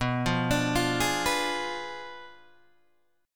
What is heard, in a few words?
B Diminished 7th B down Guitar Open G